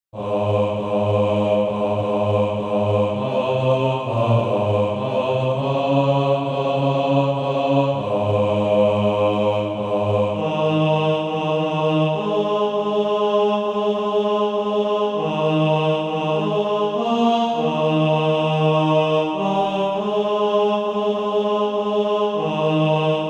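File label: bass, Practise Music; SATB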